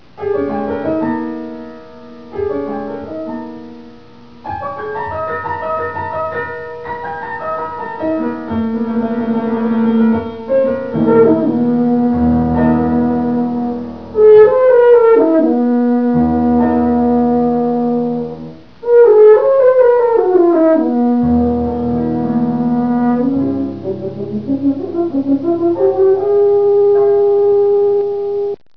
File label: horn rhapsody